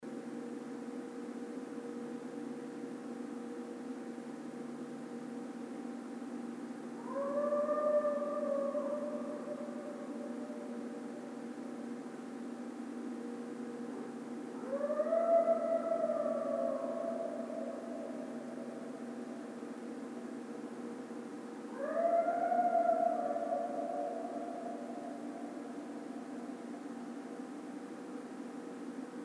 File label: howl, Coyote; Canis latrans; Coyotes (Canis latrans)